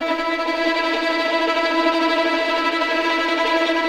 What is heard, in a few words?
VI TREM F; AKAI Advance Orchestra Upgrade 97 Vol.1